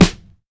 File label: acoustic snare sound a sharp key; snare drum samples